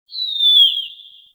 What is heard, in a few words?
Mountain Forest Predatory Bird Far